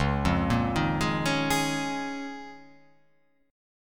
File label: Db 6th Add 9th Db down; Db6add9 arpeggiated; JGuitar